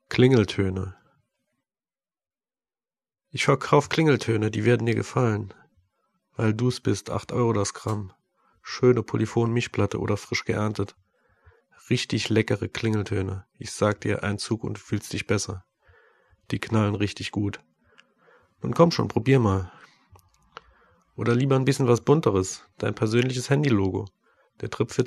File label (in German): klingeltoene